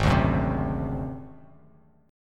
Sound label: Ab 7th Flat 5th, chord; Ab7b5 strummed; JGuitar